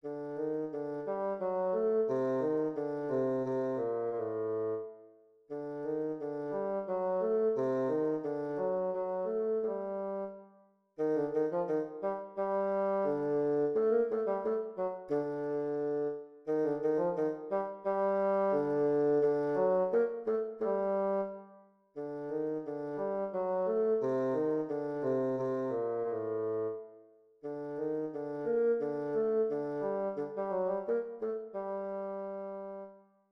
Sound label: Bassoon